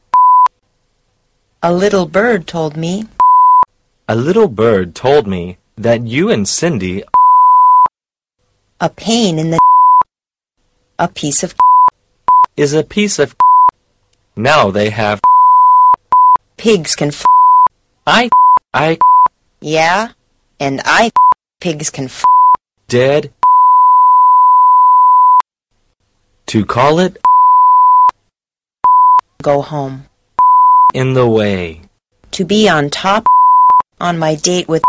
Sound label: BEEP